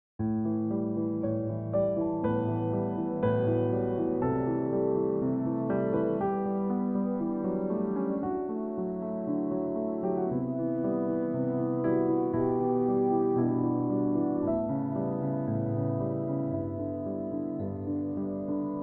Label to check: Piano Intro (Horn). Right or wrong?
Right